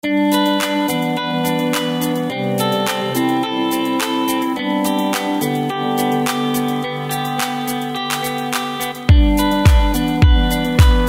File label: Motivational Modern Pop Rock Intro; SafeMusicList